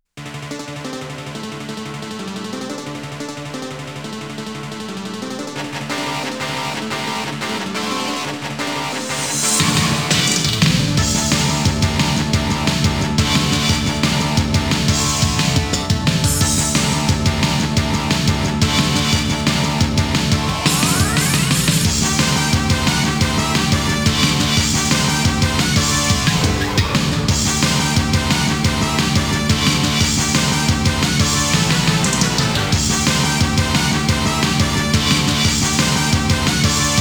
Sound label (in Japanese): チェイスBGM; konami amusement sounds 93 summer